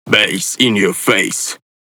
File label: Vocal Phrase